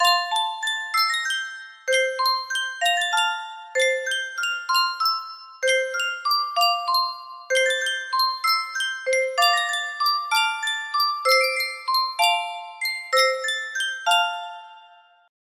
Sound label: sankyo carillon, music box melody